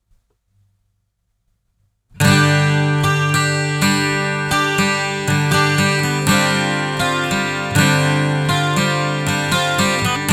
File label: 12 string G 120